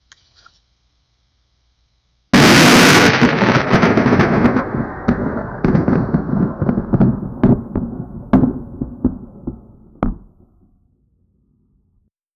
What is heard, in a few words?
meteor crashing into eart; natural disaster